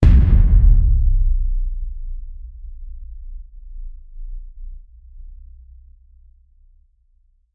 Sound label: cinematic low boom impact; trailer